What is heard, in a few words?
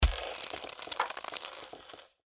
Crystal Wall; Blind Quest - The Frost Demon